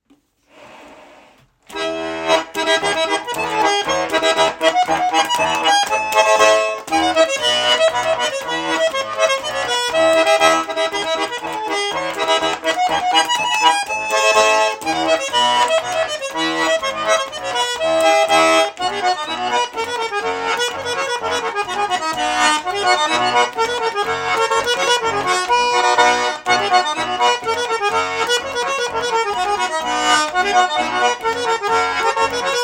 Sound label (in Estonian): Pruudiisa polka